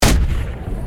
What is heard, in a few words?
WEAPONS chain Gun Shot; Barotrauma; EK modern